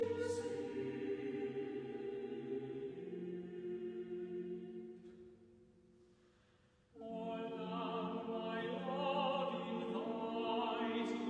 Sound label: carols